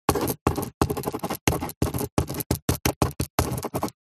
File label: Handwriting Ball Pen Desk Medium; D&H; soundbox library